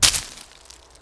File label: bush; Mafie 1